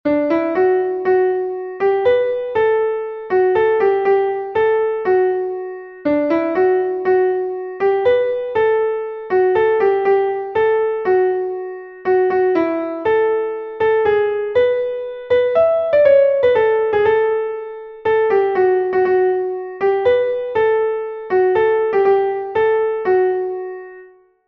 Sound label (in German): klavier; der mai ist gekommen; melodiemeister